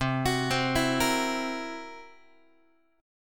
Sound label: C 7th Flat 5th C down Guitar; C7b5 arpeggiated; JGuitar